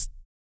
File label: TONGUE; Spectrasonics; Vocal Planet; Blues Soul